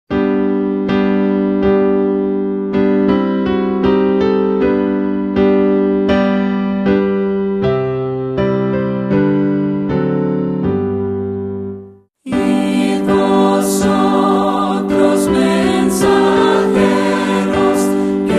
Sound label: vocal and instrumental; hymns spanish